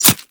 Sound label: Arrow Impact Flesh; Warframe; Bullet Impacts